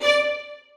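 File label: 2nd violins staccato c#5; Sonatina Symphonic Orchestra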